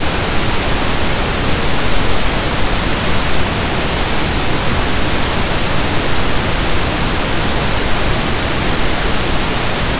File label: Pink Noise; kelvasa2015